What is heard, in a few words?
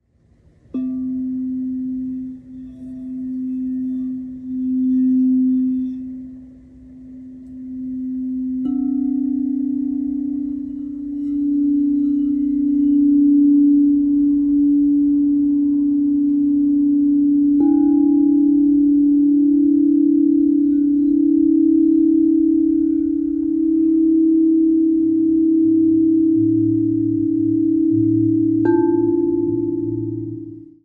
Crystal bowl; کاسه کریستالی